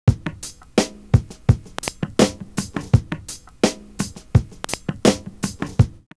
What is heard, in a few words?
groove, loop; Univers Sons